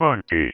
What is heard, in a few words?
Female vocals and robo-vox